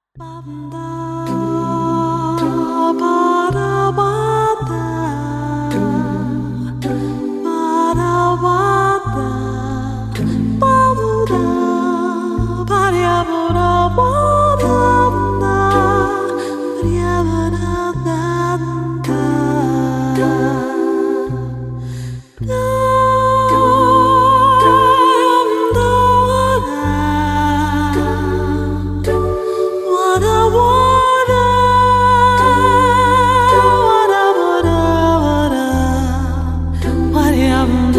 Concerto for Harpsichord No 5 in F minor BWV1056 II Largo